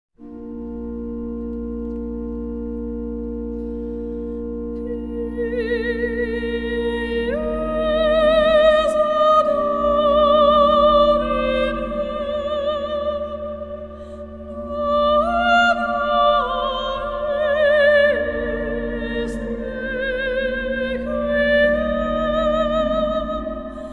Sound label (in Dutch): Pie Jesu sopraansolo